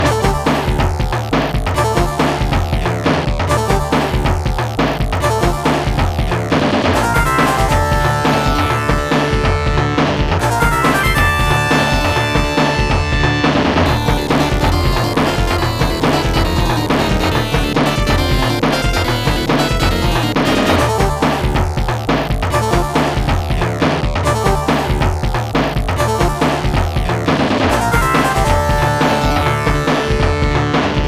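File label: background music; Final Fight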